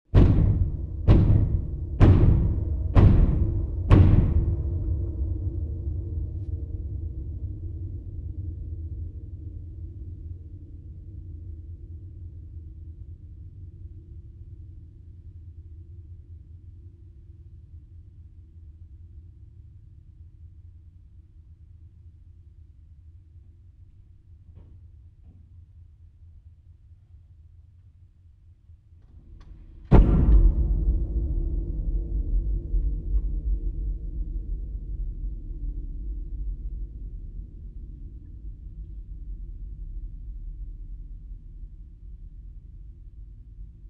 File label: impact piano x3 spaced apart good cinematic suspense horror; felix blume